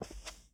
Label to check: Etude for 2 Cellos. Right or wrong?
Wrong